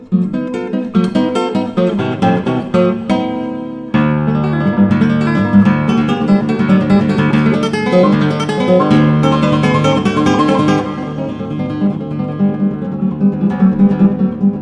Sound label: IV Tempo Sonata; Mario Castelnuovo-Tedesco